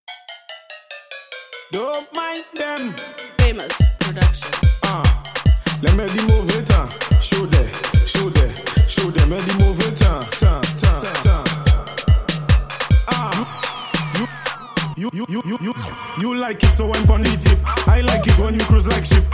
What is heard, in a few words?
riddim